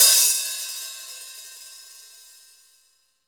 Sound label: CYM, Roland; Club-50 - Foundations Roland; Naturals A; Natural 2